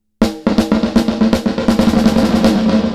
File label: Delay CHORUS; Cluster